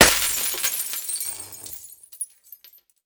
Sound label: light gas break; Escape from Tarkov